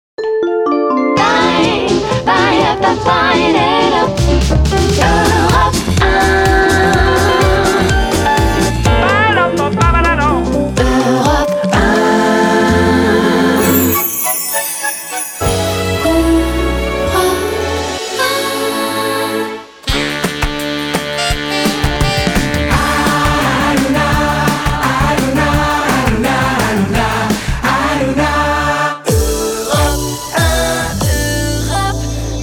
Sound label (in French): Habillage Son Jingle